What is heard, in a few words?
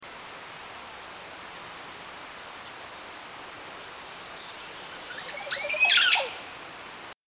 oropendola bird chirp; sueno azul resort